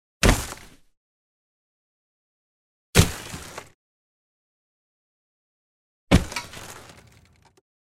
tri varianta kasanija

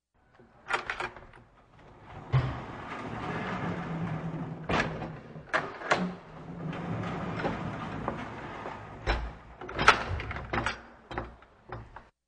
Dver na polozkah

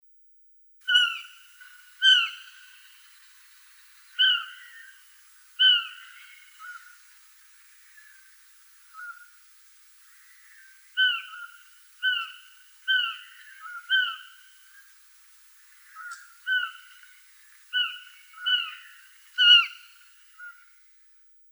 pohozhie na zhalobu

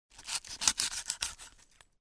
rezhut; Звук ножа; Звук метания ножа

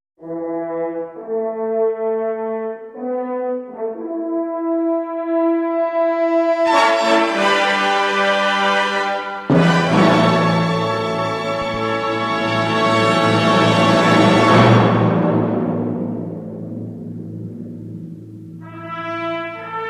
Otkrytie torzhestvennogo meropriyatiua; Звук фанфар на начало праздника, на выход ведущих, или перед награждением

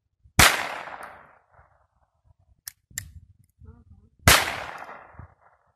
signalnyj s kurkom

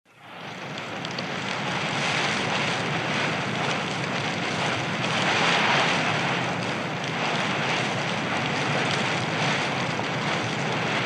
holodnyj veter sredi golyh derevev; Звук ветра в лесу; Звук ветра в листве

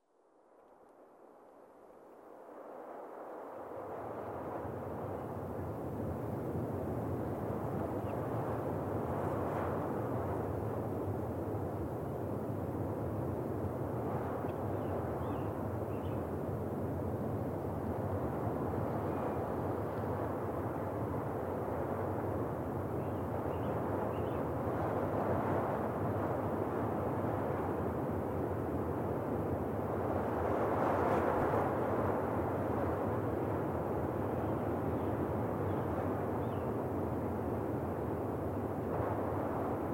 gudit v lesu; Звук ветра в лесу; Звук ветра в листве

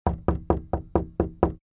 trevozhnye stuki po derevu kostjashkami; Звук стука в дверь для сказки; Для детей, для детских постановок

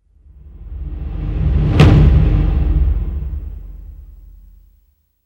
Trevozhny akcent

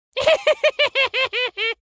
zloy smeh karlika

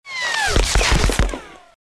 priletelo i upalo

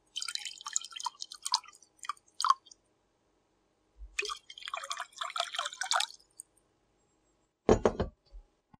nalivaut vodu v stakan

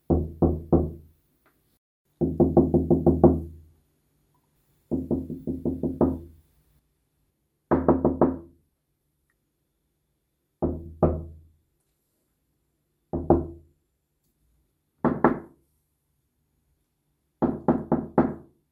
varianty stukov v legkuju mezhkomnatnuju

